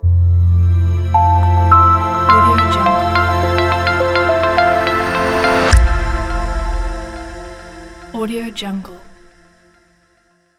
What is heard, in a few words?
trendy logo